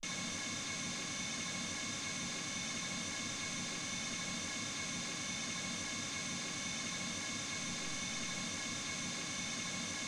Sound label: mls 48kHz